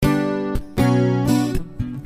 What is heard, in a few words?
Punchy G,Bm 120